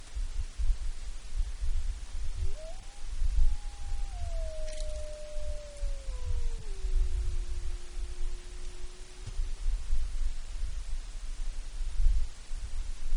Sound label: Wolves Howling - Solo Voice Then Group Chorus; Alaska; MAMMALS